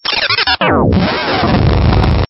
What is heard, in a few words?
Stinger; STINGERS